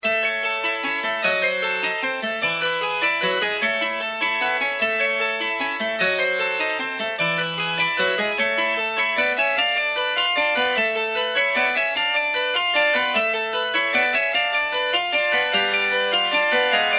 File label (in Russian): klavesyn